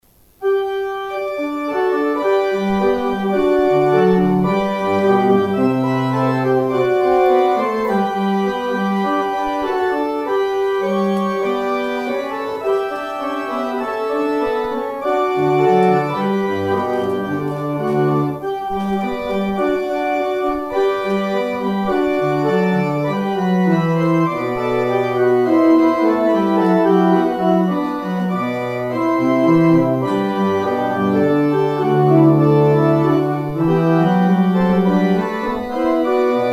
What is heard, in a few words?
organ outro; Organ exit music